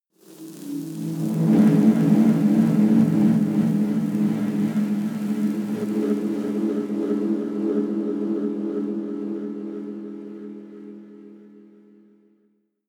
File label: scrapes grainy reverb, Drones; musikelemente